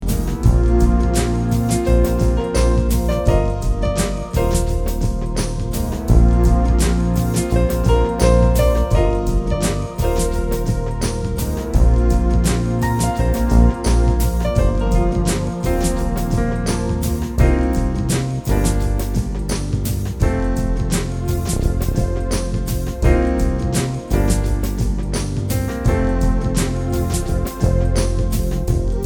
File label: slow groove; Digsafe